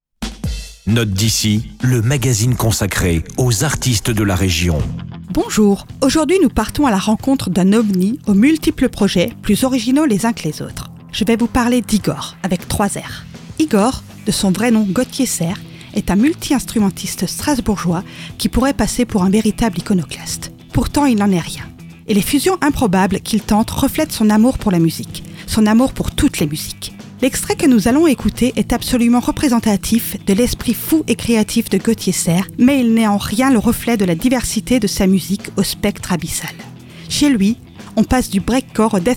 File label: spirituality and distortion; Note d'ici, chronique musicale; La Médiathèque de Sélestat; Azur FM